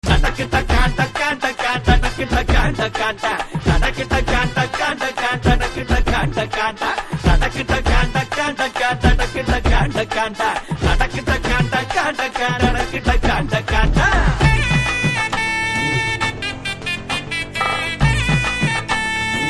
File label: BGM Ringtone; Soorarai Pottru